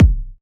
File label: kick machine